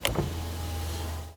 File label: Car Auto Window Up; Nova Sound